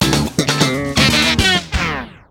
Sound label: Mission Failed; Critical Velocity